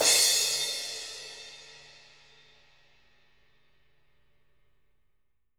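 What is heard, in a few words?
16 crash ff, jazz crashes, Living Drums; Sampleheads; Peter Erskine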